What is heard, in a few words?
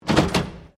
SLOT MACHINE SOCCER TABLE OPERATE, Various old coin slot machines; Slot Machine Soccer Table 1940; Casinos